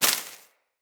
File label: Bullet Impact Debris Grass; Weapons